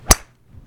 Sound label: Golf